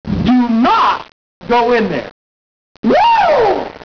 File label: flush